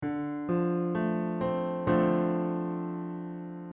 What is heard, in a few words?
Dm7 Chord